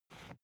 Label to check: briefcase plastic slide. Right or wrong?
Right